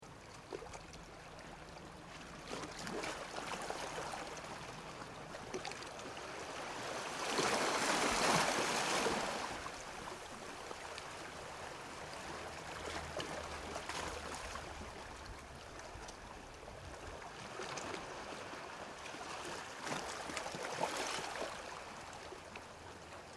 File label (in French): sanary gs plan